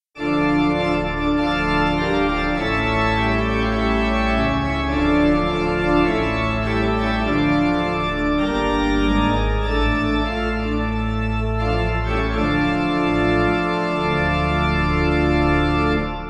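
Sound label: pipe organ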